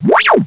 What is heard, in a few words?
Warp; Stardust 1.1